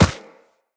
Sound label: Run Dirt, Footsteps; Characters; Operator